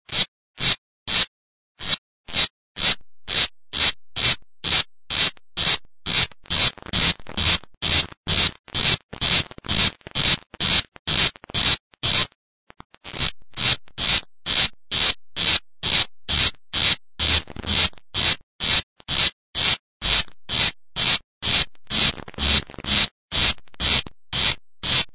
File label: Sound Oophaga Vicentei; Canto